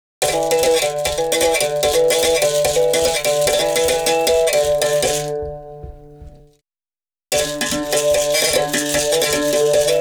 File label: Didgeridoo And Overtone; Good Stuff Audio